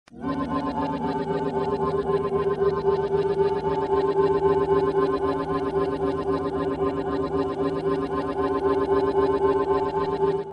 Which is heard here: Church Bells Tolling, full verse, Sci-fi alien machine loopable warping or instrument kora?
Sci-fi alien machine loopable warping